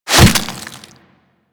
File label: Desert Skate Barb Hit Flesh A; Warframe; Attacks; Hit Effects